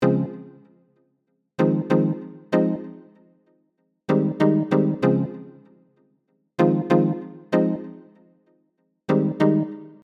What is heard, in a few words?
Keys